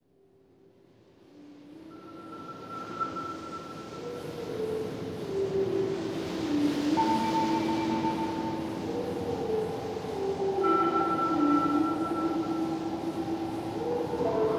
Backing track

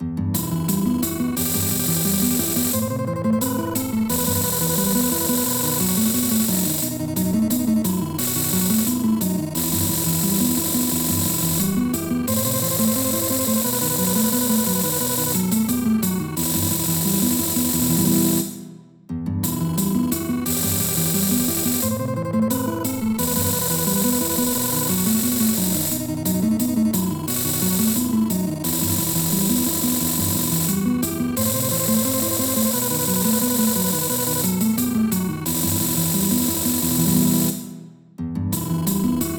Song and Dance; MTWFC VOL2 Parts1&2 Audio Accompaniments

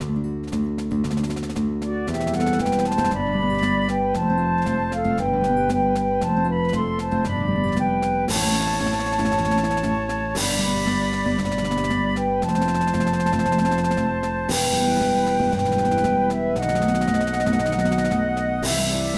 Fanfare; MTWFC VOL2 Parts1&2 Audio Accompaniments

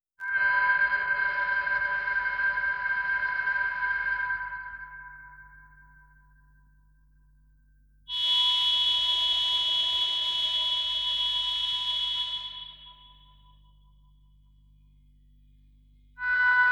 Backing track

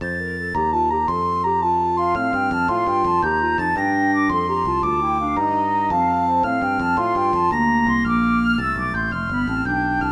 Jig; Haymaker's Jig; MTWFC VOL1 Audio Accompaniments

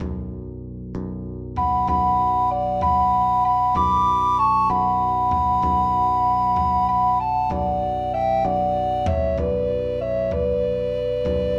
Peruvian Song; MTWFC VOL1 Audio Accompaniments

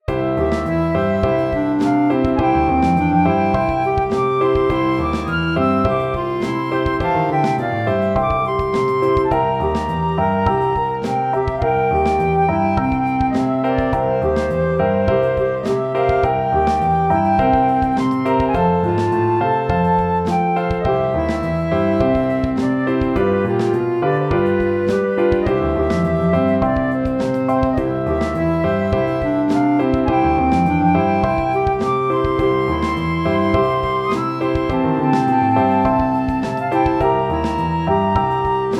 Slow Rock; MTWFC VOL2 Parts1&2 Audio Accompaniments

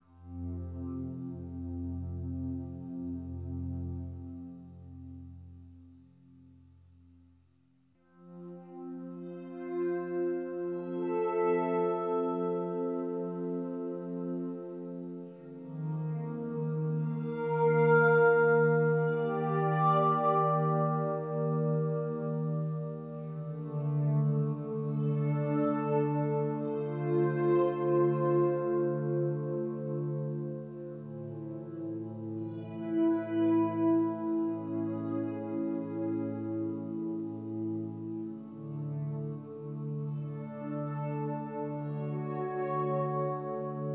Backing track

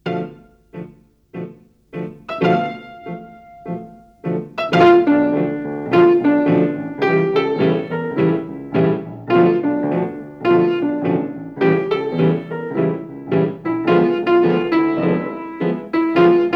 Backing track

